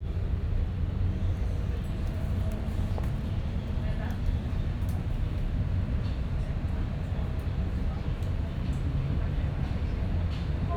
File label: U Bahn Berlin; Sound Scapes